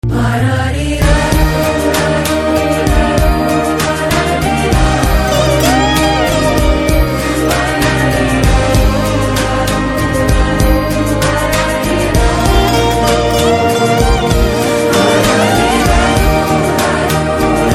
Karaoke

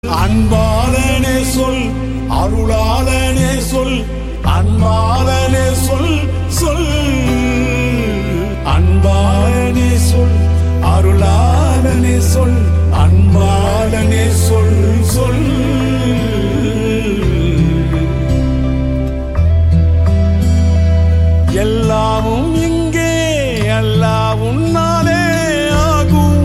Ringtone

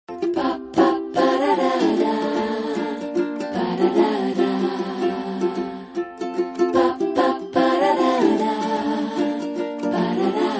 BG Humming Tone

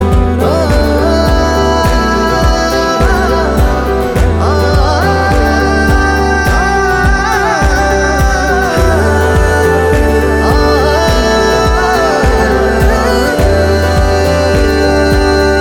Humming; Oru Adaar Love

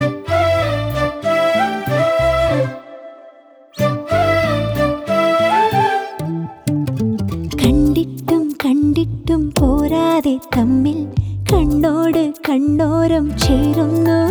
female ringtone

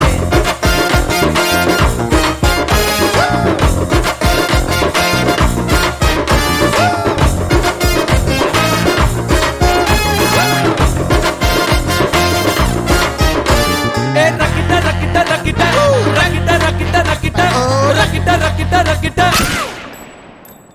Love BGM; Jagame Thandhiram

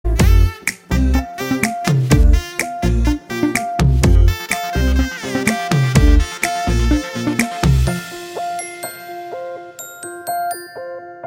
Love Bgm; Majili

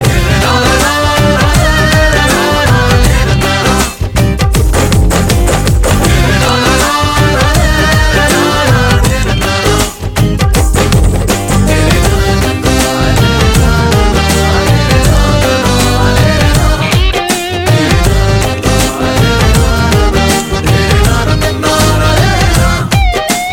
Musical Tone

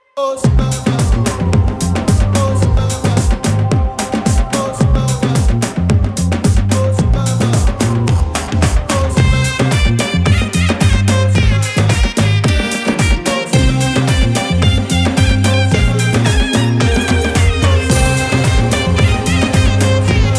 Drum Beats

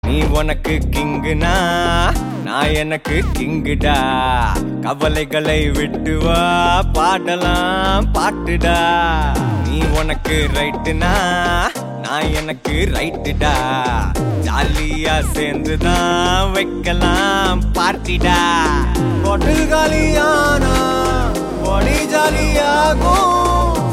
Starting Ringtone

